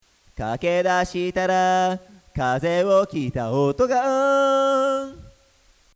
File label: noise before